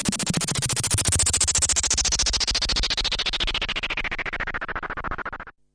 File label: noise, Noise Sweeps